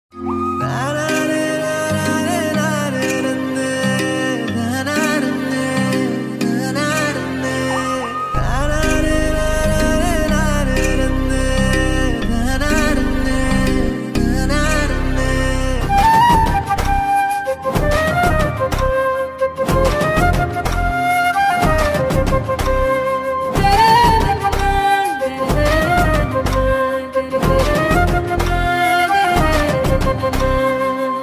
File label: love bgm ringtones; asuran